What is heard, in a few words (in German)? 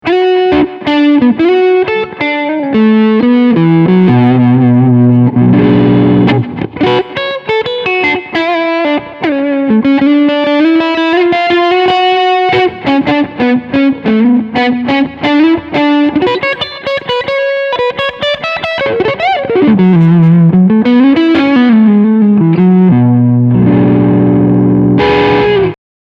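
Crunch sound beide Humbucker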